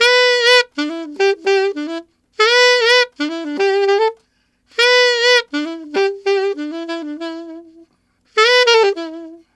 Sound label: Alto Rock in E7, Alto Sax; Sonic Foundry (Sony Creative Software)